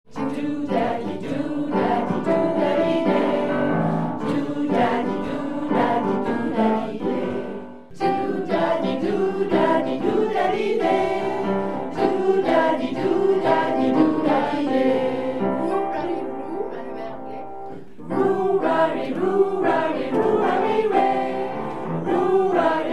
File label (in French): jeu vocal doo da